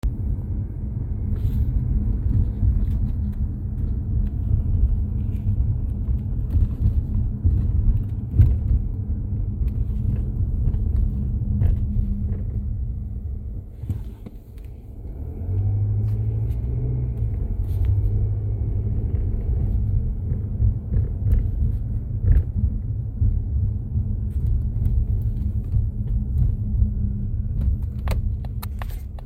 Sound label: weird strong vibrations vocal; mazda cx-30